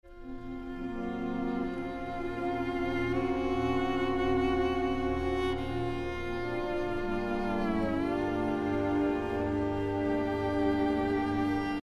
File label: fall; RX8 Slow Sens5.0